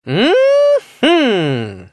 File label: eat correct; codeapillar